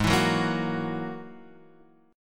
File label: G sharp 7th Suspended 2nd Sharp 5th, Guitar, Half Step Down